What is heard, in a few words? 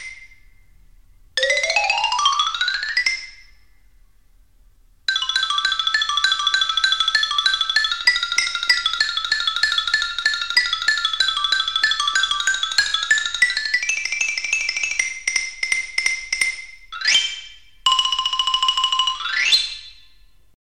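xylophone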